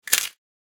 bone crack; Stereo

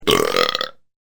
burp

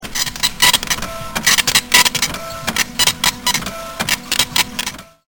printer

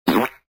Zip Fart

